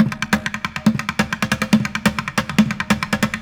Street Groove, Ambient Grooves; Sonic Foundry (Sony Creative Software)

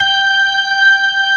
B3 ORGAN, Dance Organs; Techno Trance

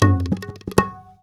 INDIA, INDIAN SET; Spectrasonics; Heart of Asia

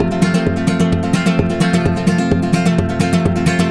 130 F Rumba; Spanish And Gypsy Traditions; Soundscan vol.59; AKAI

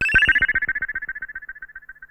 Perc Synth Moog Delay

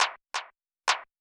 Claps, Club Techno, 137 BPM; Zero-G; Construction Kits

